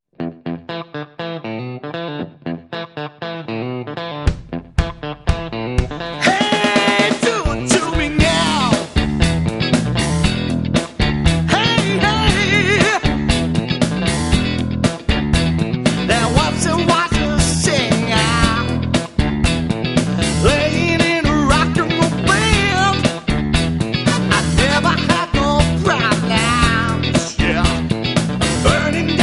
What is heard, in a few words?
funky music